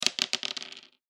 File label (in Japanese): 中くらい ダブル ダイス